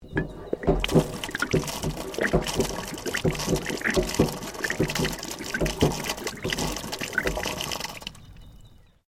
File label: PUMP WATER HAND OPERATED; water pumps; Machinery; Machines